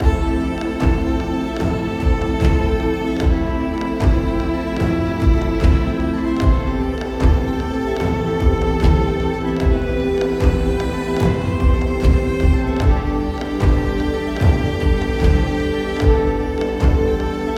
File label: Synth Fantasy Electro; Synth Fantasy Music Pack Vol. 2